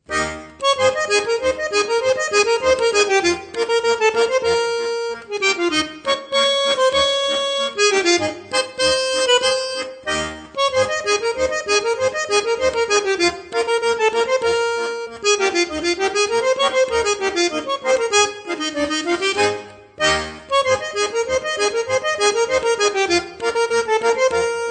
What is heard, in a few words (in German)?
schottisch